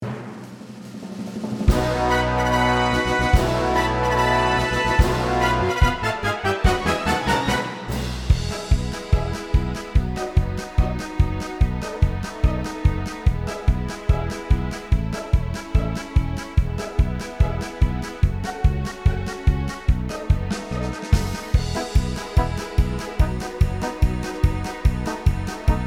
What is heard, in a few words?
Instrumental